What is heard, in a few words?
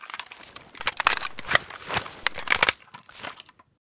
reload, weapons; vpo101